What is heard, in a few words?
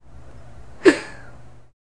soft distant cough of a, child